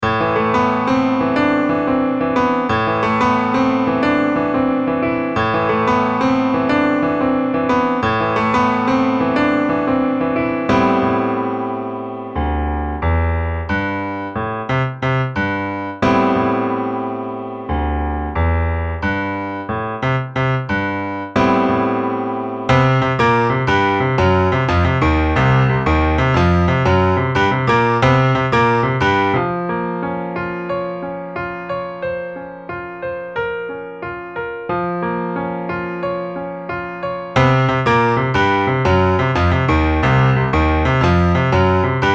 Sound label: piano patterns